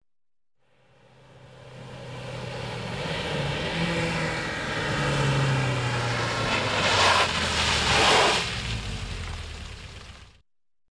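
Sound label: car drives up and brakes on gravel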